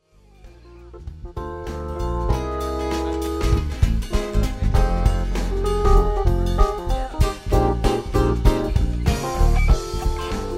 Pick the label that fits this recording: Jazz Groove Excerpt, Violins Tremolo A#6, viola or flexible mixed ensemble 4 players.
Jazz Groove Excerpt